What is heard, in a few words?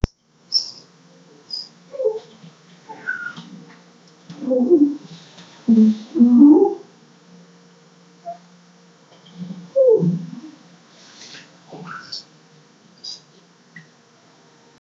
create the sounds of sex